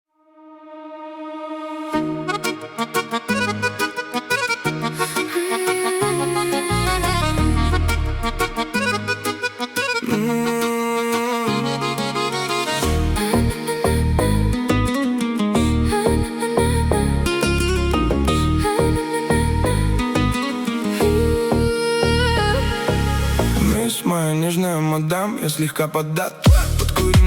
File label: deep house